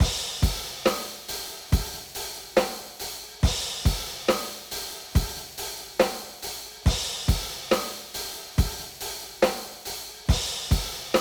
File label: rock